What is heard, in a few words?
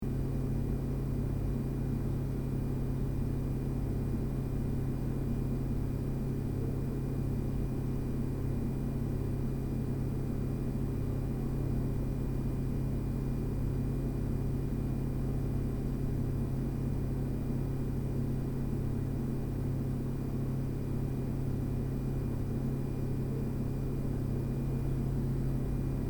Kitchen ambience appliance hum and buzz loop